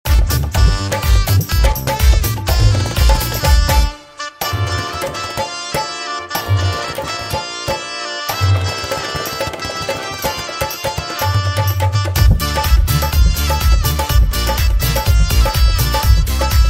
sehnai dholki tabla mix background music

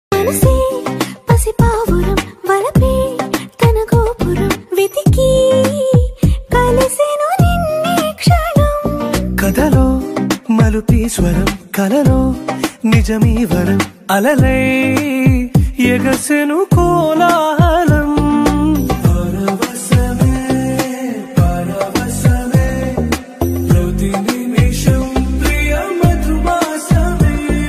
telugu ringtone

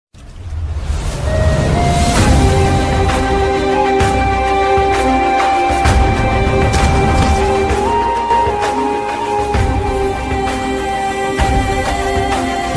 mass movie bgm; surya

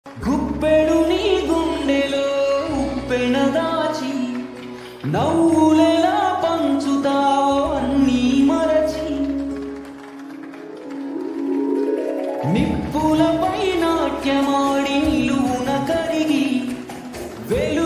sad version song; uppena; vimanam

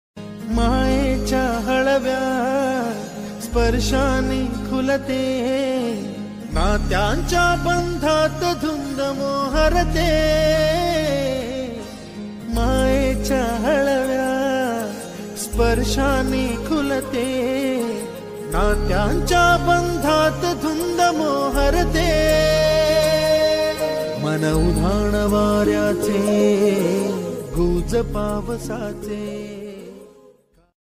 marathi song

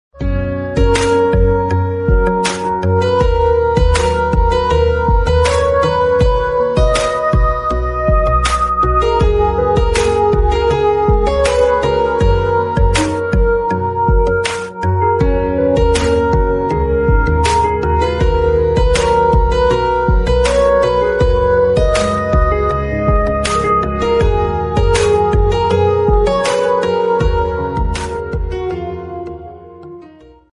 bgm ringtone instrumental

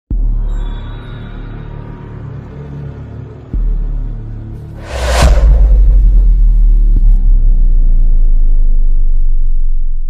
romantic ringtone, love ringtone

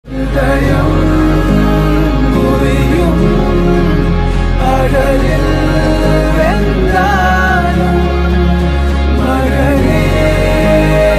movie sad ringtone sad ringtone

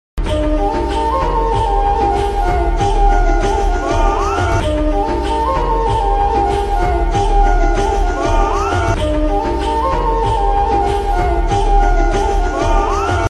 islamic ringtone

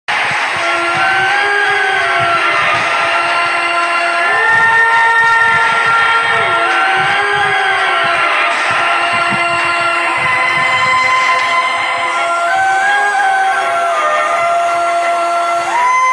haunted music tunes; raj mahal 2

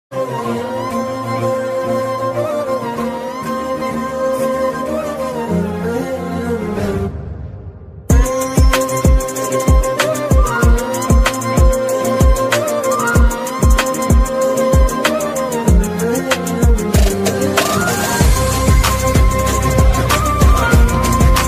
hip hop bgm ringtone; ethir neechal

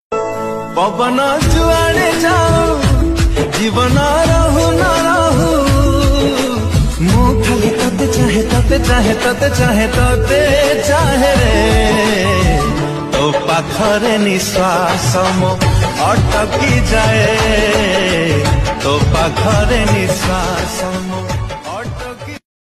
odia bhajan; jagannath ringtone

